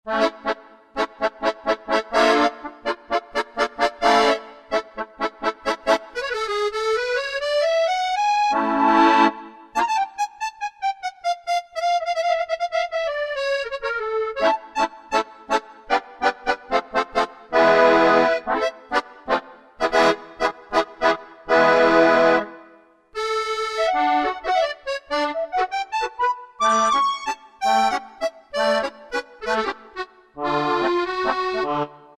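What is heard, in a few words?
Accordion; Roland SR-JV80-18 World Collection Latin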